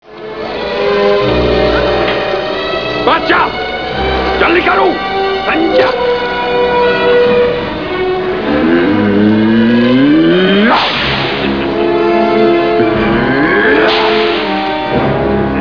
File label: whips; Mola Ram gives orders to the guard. Indy jerks as the bullwhip rips his shirt and tears opens his flesh.